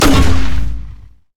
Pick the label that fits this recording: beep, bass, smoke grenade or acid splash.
smoke grenade